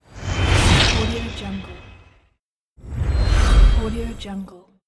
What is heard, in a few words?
bullet slow time